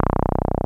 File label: 303 MID, BASSES; Pro-Rec - Synthsonic